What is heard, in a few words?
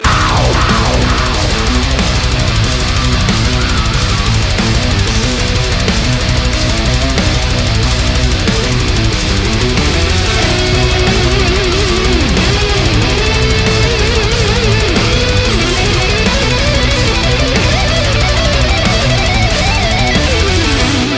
Death Metal